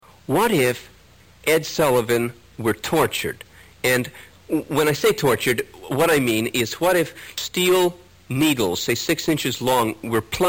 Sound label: jingle